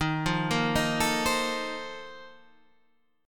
A sharp Major 7th Suspended 2nd Suspended 4th

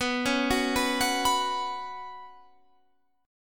F sharp Suspended 4th B

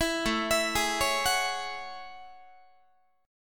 A Major 13th Guitar; AM13 arpeggiated

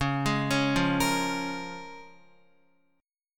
Db 6th Db, down, Guitar; Db6 arpeggiated; JGuitar

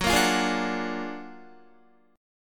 Gb Augmented Major 9th Guitar; Gb+M9 strummed; JGuitar

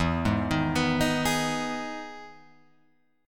E Major 7th E down Guitar Open F; EM7 arpeggiated; JGuitar

